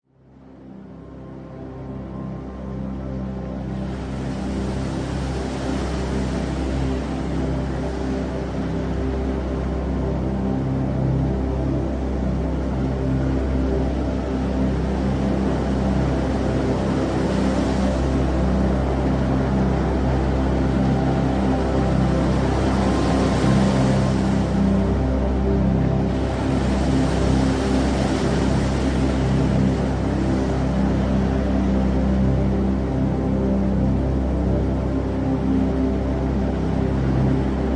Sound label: Meditation Ocean